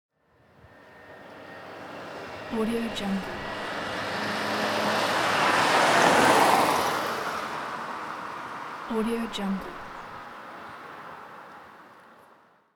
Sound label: car passing by